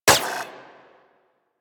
Braton Prime Redux Prime Layer, weapons; Warframe

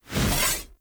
Two Handed Axe Whoosh Heavy; Weapons; Enemy; Axes; Sinecure Two Handed Axe

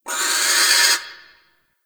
Owl Critters Vocal Alerted; Ambience; Duviri; Warframe

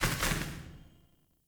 Sparrow Death State Two Wing Flaps; Animals

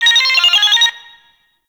Necramech Friendly Hack Jingle; Warframe; Entrati

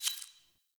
UI Default Theme Squad Member Joined; Warframe

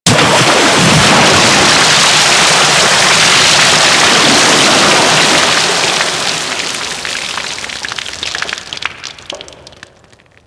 Ocean Water Rush; Ambience; Warframe; Grineer Ocean

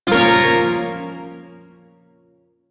Shawzin Hirajoshi Chord C; Warframe; Duviri Shawzin

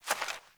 Fawn Footstep, Foley; Animals; Ambient

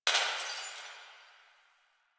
Distant Glass Breaking

